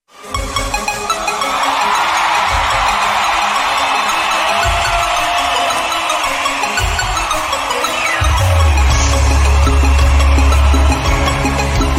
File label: bi klam